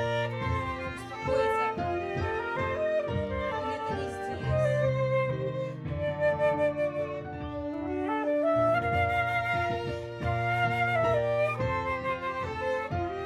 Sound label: Flute